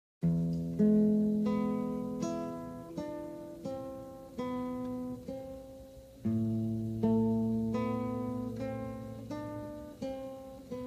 GUITAR IMPROVISATIONS

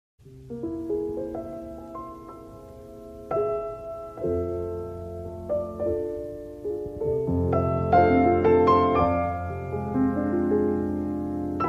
PIANO IMPROVISATION

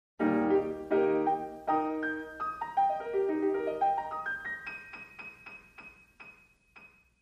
GRAND PIANO

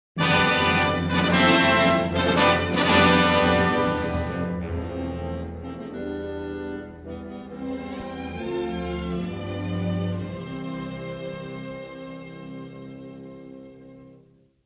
ROYAL FANFARE

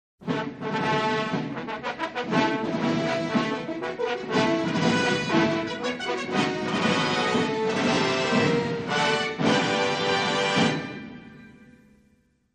ALTERNATE FANFARE

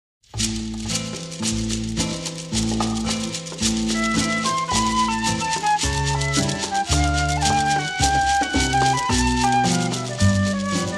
INSTRUMENTAL

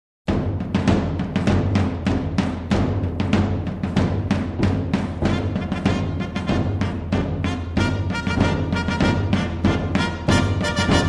FANFARE FOR CORTEGE

